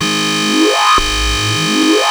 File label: OSCAR G#1, synthesizer; Hollywood Edge - Giorgio Moroder Rare Synthesizer Collection